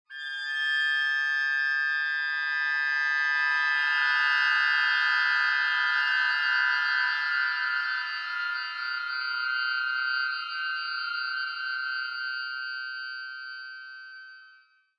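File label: Clarinet Cluster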